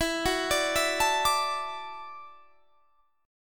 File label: E 7th Suspended 2nd Suspended 4th, down; E7sus2sus4; arpeggiated